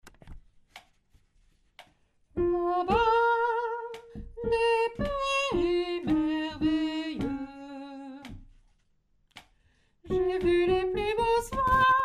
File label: soprano